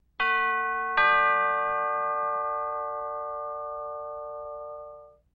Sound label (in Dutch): deurbel